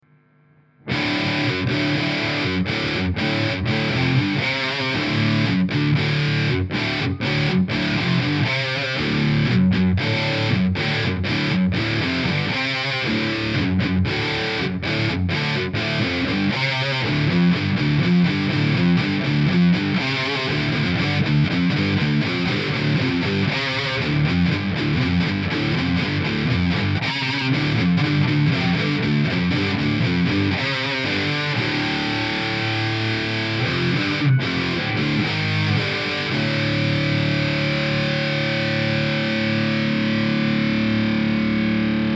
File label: 3 dubs tight dry wet; tc electronic mimiq doubler